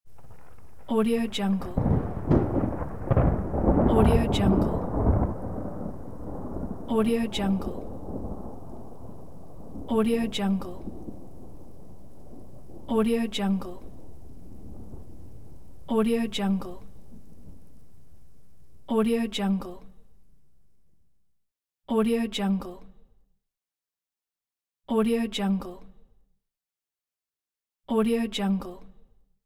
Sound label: thunder medium distant rolling rain on pine; hollywoodedge